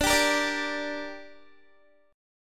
D Suspended 4th Sharp 5th, Guitar; 21,22,22,19,19,x; strummed; 4F,4A,5C,5G,5C,6E

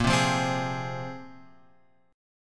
A sharp 7th Suspended 2nd; A#7sus2 Chord {6 3 3 5 X 4} strummed

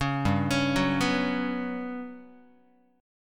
G Major 7th Flat 5th, Guitar; arpeggiated; JGuitar

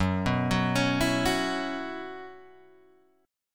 F sharp 7th Suspended 4th, Guitar, Open G

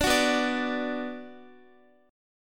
B Minor B Guitar; strummed

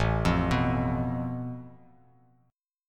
A Suspended 2nd Sharp 5th, Bass Standard; Asus2#5; arpeggiated; x,0,3,4; JGuitar

